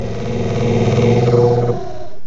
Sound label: time travel; histacom; windows 95